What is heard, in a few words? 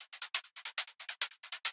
LOOP 138 Bpm